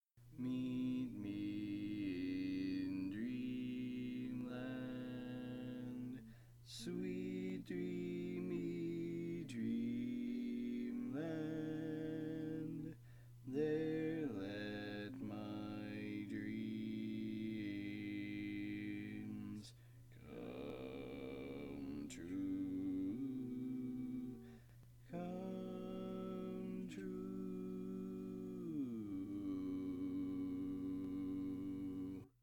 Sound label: Bass, barbershop tags